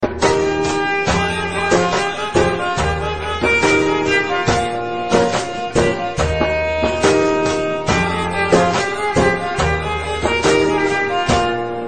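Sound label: Violin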